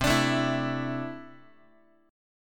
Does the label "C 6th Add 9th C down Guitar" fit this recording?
Yes